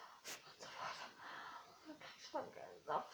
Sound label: click